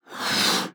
Balloon Blow

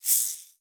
Shaker Shake

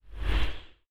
Swoosh Transition

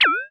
Bleep

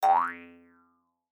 Acoustic Doink

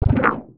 Sci-fi Pulse Gun Blast

